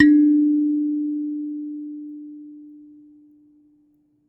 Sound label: Finger, Prepared Kit; Battery 3 Sample Kits